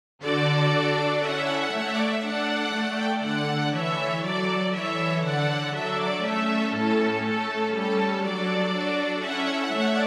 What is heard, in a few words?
Trumpet Voluntary